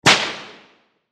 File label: Whip Crack Bullwhip Impact Snap Reverb; Warfare